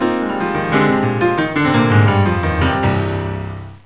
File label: piano